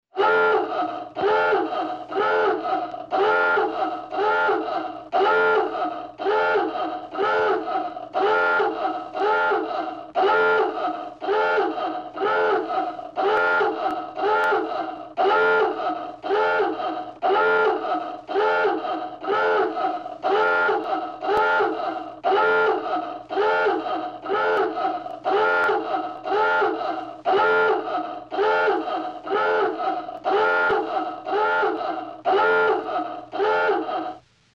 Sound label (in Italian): sottomarino, suono di sottomarino